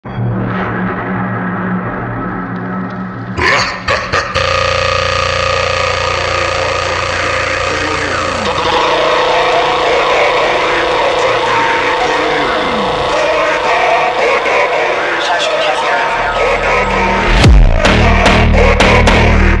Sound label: ukrainian cossack phonk super slowed